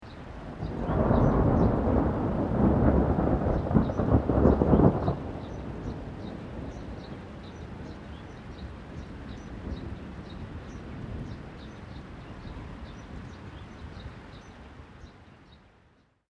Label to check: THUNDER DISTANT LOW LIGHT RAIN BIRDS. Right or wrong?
Right